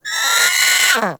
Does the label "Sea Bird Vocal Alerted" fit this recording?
Yes